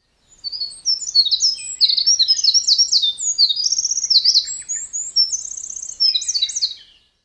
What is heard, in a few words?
sado forest